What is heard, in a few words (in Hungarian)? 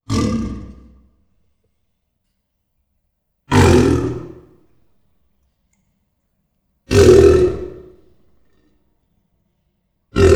kinai aligator; miskolci zoo 2009; papaszemes kajman